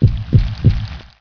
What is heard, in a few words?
fastrun heartbeat; nst zombie